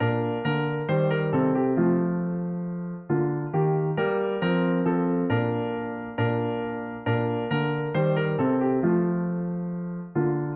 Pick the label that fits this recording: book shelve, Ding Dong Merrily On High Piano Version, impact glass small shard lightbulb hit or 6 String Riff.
Ding Dong Merrily On High Piano Version